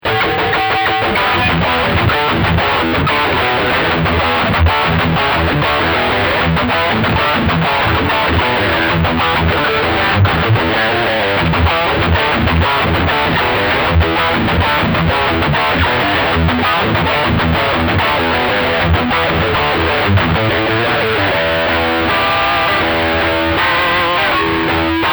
Lead

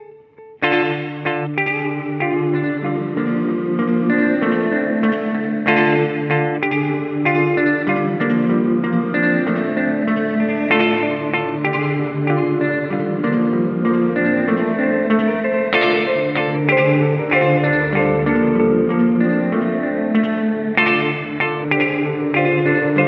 Room Delay; Red Panda Context V2

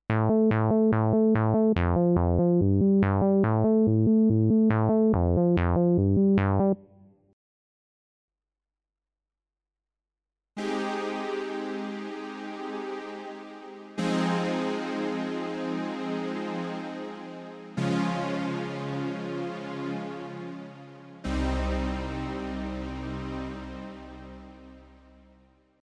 VR09 Synth

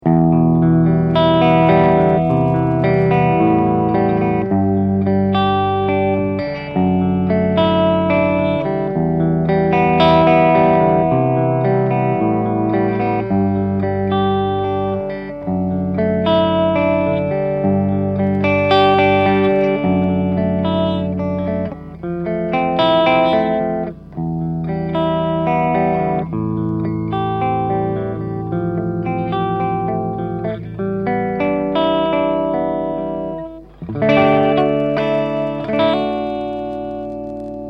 Clean Red Box

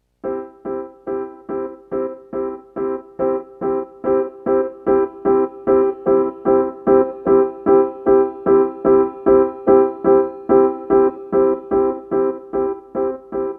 Piano E Piano; Sound Focus

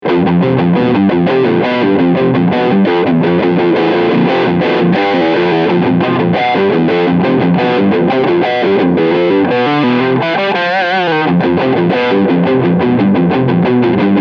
DIST Riff

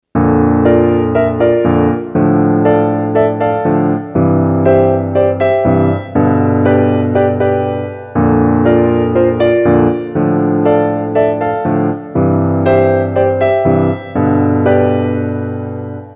Alesis Fusion Grand

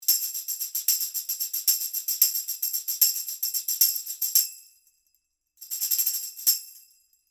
GA-251 OMNI Tambourine

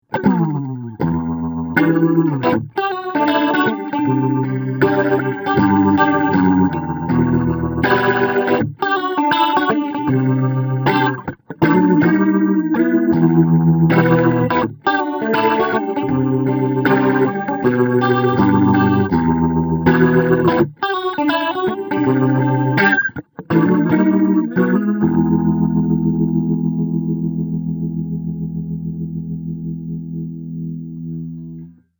leslie

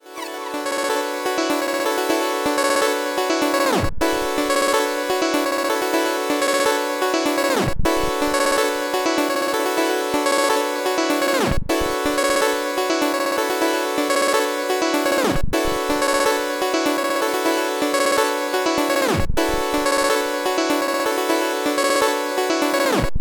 Beatbreaker; 1010music; fxbox

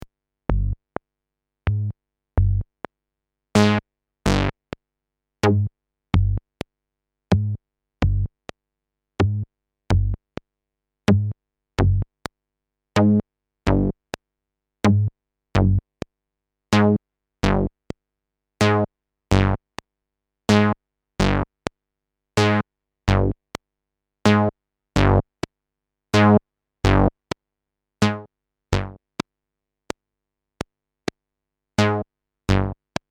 system700 env klick

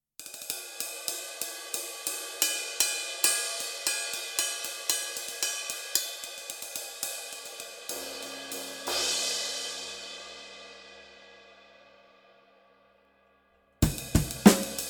Tradition Light Ride

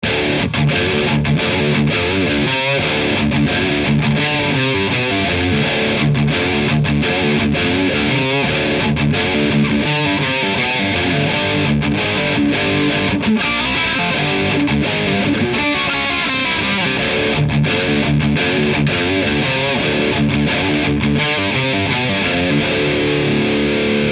HK TM36 Highgain Mic.

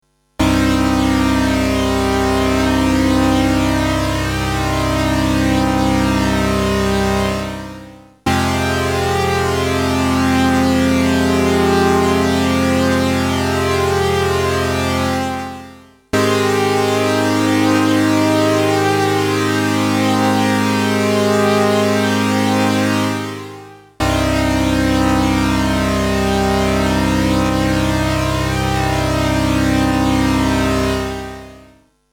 Boss CE-300

